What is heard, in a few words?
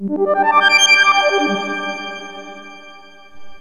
SYNTH FX